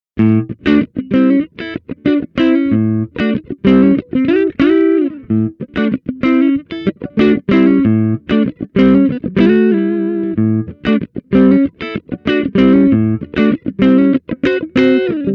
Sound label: PAF Neck